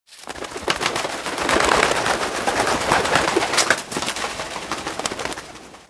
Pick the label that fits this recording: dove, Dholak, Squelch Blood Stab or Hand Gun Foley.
dove